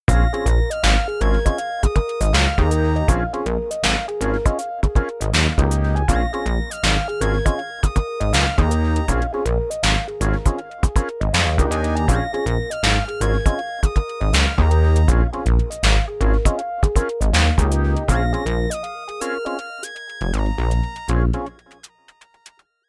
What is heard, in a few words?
minimog; instruments; minimogueva